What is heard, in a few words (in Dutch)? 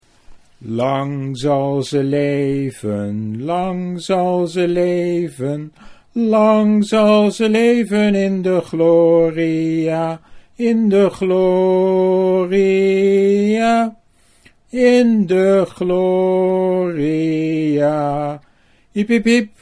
lang zal ze leven, Dutch